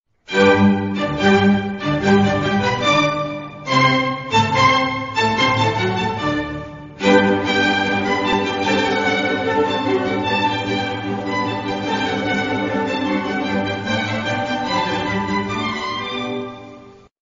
Violin Solo

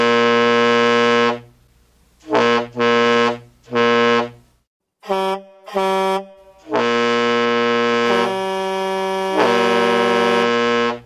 Truck Horns

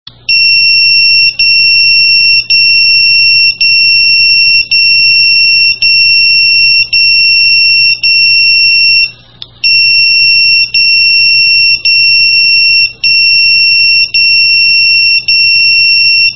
Paging Tone

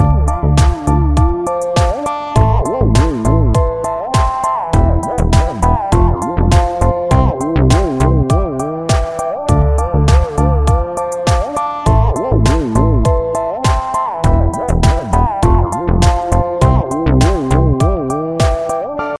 Relax Moody

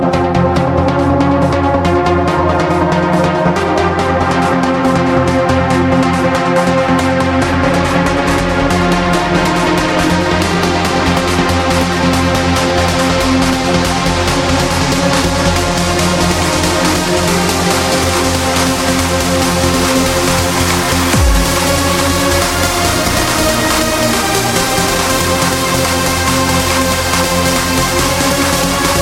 Melodic Trance

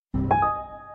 Error Notification

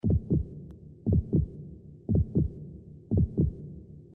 Heart Beat

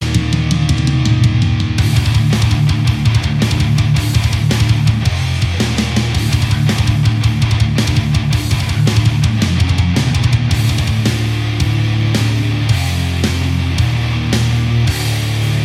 Angry Metal

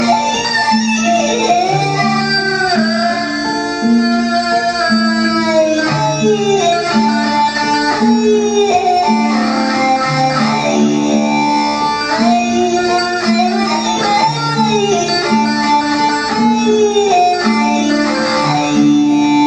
Electric Violin

